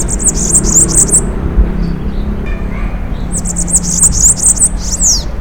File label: Coereba flaveola song